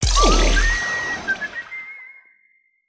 Celebrate; foley

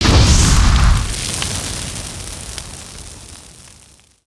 Samurai Arrow Impact

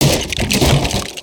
Crates n Boxes, destructibles

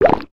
poke, creatures organic

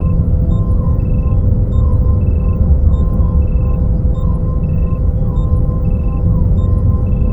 Projection Room, ambience nexus tower

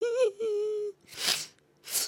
cry

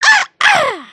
soccer; emotes

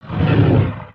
Pet Dragon Speak; creatures pets

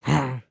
Walk; Lego Strombie; build mode

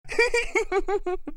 Giggle

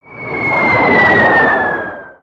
Spider Boss Fire Rain Whistle; creatures mechanical

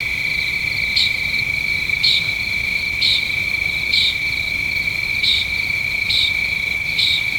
night bird loop; nimbus station

